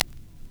RECORD, Vinyl Scratches; E-MU Producer Series Vol. 8 – Platinum Phatt

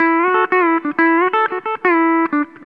COUNTRY

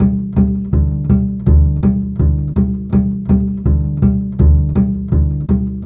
Acoustic Bass

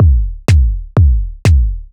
Strip 124bpm, House Drumloops

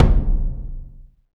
HYBRID, Heart of Africa; Spectrasonics; AF.HYBRID

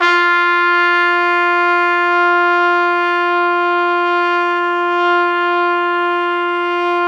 F3, Pop Brass; Best Service ProSamples vol.19; AKAI

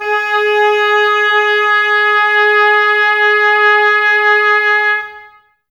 OBOES G#; Miroslav Vitous

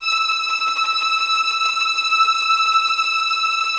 VI TREM F

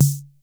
KR33 PERC, drum; Crypton - DrumBase5000

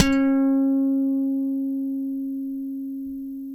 FUNK, Bass Legends; Spectrasonics

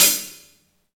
XV Hat C, Roland XV-5080

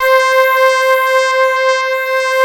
PPG 2.3, Analog Fantasies; USB Soundscan vol.49; AKAI

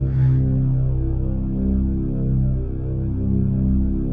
PAD, DIGI-PADS

